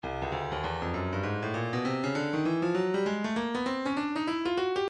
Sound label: breakbeat midi, 99 bpm; offing b; Stylus Breakbeat Midi Files